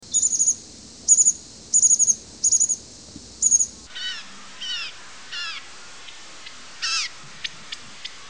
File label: The sounds of birds